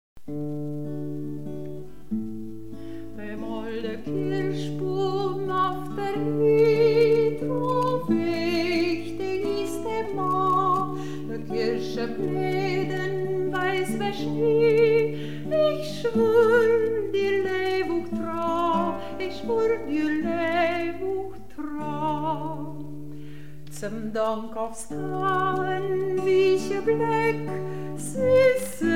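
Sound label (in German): Umgangssächsisch; Bäm åålde Kirschbum; schaessburg; Hegt wird gesangen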